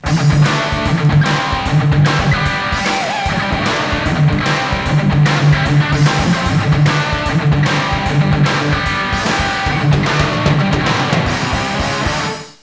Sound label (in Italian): suonerie musica rock